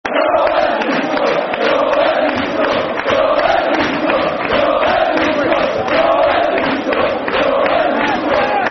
We All Hate Leeds Scum [Football Chant (Soccer Songs)]; Preston North End Pne